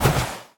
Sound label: Ion Thrust Combined Loop; Player